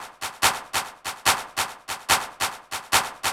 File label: 144 Techno Claps; Dance DJ; Percussion; Digidesign; Pro Tools; Pro Tools Essential Loops